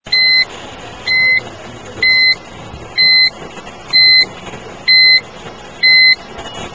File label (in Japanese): kuruma reverse; 着信音やアラーム（目覚まし）音に使える、着モーションとして; FOMA